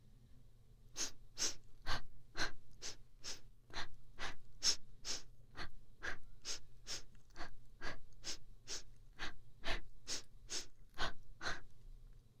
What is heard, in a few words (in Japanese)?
ランニング呼吸 右; 呼吸音足音なし; 右耳用